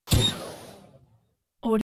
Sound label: whompy pneumatic blasts short bursts tubular